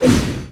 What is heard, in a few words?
dualswords